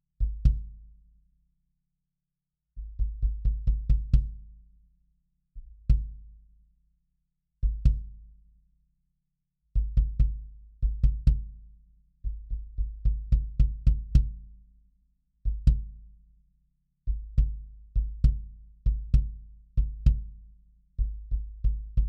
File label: Kick Modern; sE Electronics V Kick